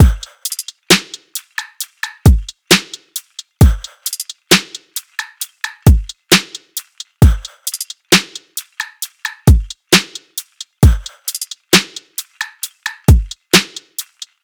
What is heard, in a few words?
drums 133; Full Drum Loops; Slate; SEISMIC Drum Sample Pack